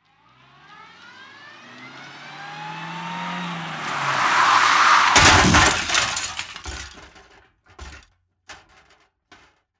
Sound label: car crash scene